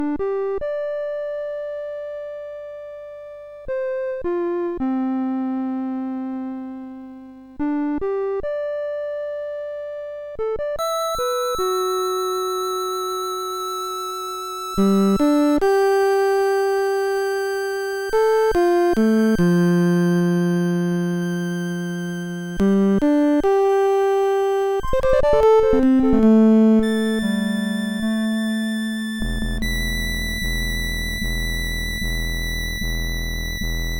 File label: 8-bit, MOS6581R2; 8-bit Civilizations